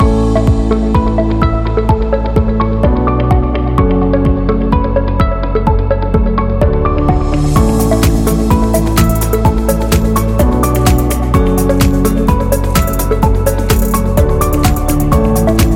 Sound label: Corporate Upbeat Loop Background